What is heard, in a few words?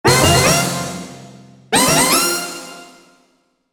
JINGLE goal